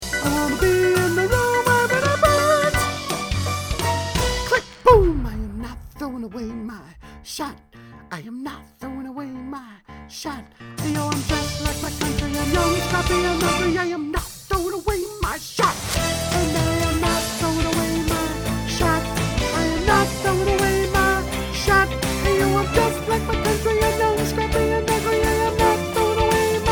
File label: soprano